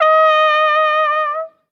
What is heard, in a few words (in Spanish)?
corneta manchega